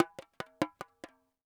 CLAY, CAMEROON; AKAI S6000 CD-ROM; WorldSounds

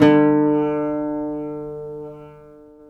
LUTH 2 D3, Arabian Traditions; USB Soundscan vol.43; AKAI

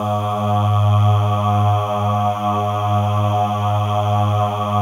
MALE AHS, Voices; Spectrasonics

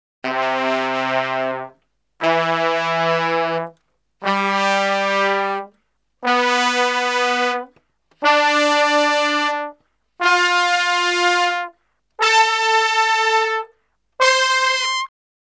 Salsa Picante; Masterbits; World Zone VOL-3

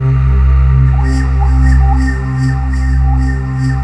deep forest C2

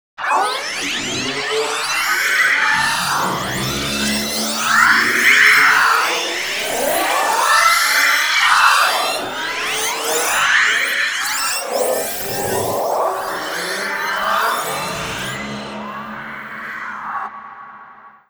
BELUGA; USB Soundscan vol.33; AKAI; Disjointed Textures

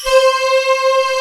LINEAR SYNTH

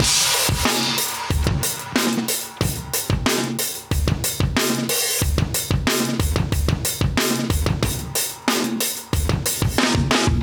92 HyperSleep Space Mystery, Live Breaks

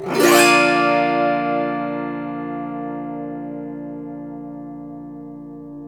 AUTO HARP, Auto Harp Majors

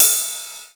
house ride; USB Soundscan vol.11; Drums Dance & Groove; AKAI; HOUSE KIT

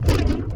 SHEET MET, MACHINES

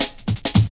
Build Up Cut, Drum Loops 166.5; Zero-G Jungle Warfare 1, 2 & 3

